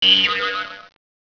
Boing; Monster Hunt

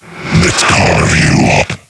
Roar; KF Christmas; Scrake

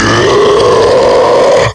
Pound Roar

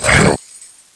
Clot Jump, Elf

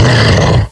Pound Pain

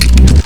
Warhead Pickup

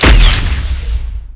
Impact Hammer

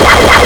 Pulse Fire; Monster Hunt

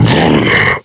Attack; KF Christmas; Jack Frost

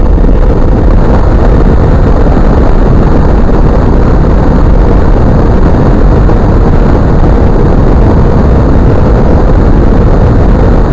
Amb Sound

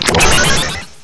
Belt; TheDanes UT Projects; DanesSniperModMedia

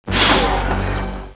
Jump

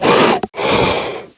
Stalker Roar

